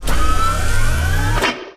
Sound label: Scifi Power Up Mech Arm; Energy & Forcefields